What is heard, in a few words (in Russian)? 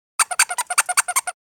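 children's toy squeaks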